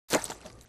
bullet light ballistic mud debris; Titanfall 2

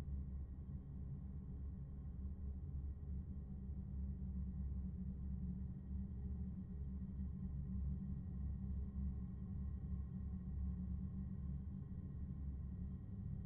amb int holodeck; Titanfall 2

